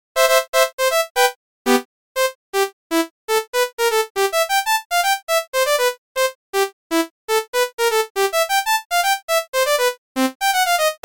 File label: mario midi